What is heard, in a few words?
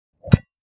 Metal fire extinguisher put down